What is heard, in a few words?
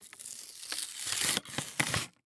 plastic package open